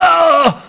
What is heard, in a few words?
hit; BattleSNDS